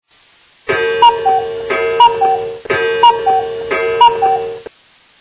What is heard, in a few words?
Cuckoo Clock